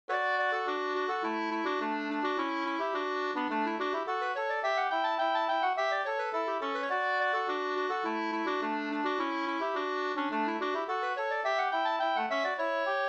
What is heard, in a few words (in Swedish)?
slangpolska 2st